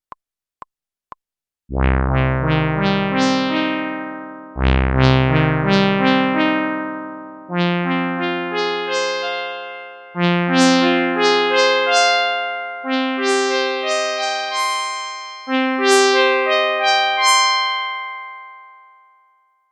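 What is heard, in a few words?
Nord Stage EX vs Nord Stage 4 Saw Resonance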